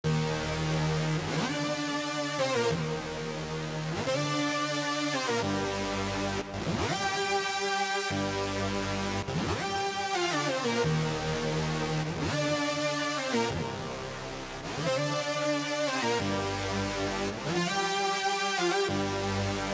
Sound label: synth, Gmin, 089; Big Fish Audio; Legendary; How Can I